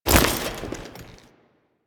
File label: Foley Step; Warframe; Kahl